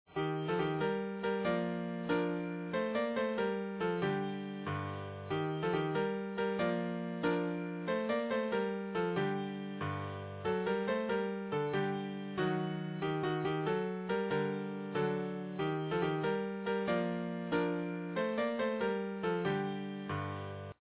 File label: musette